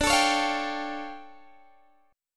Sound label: D Minor Major 11th, down, Guitar, Open D